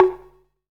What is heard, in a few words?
PERC FX, Dance Drums; Best Service; Dance Mega Drums